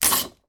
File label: office tape sticky rip; sound effects one